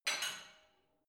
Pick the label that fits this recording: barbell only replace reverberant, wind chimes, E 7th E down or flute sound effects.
barbell only replace reverberant